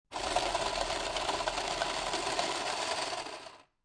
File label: Playing Cards Automatic Cards Shuffler Run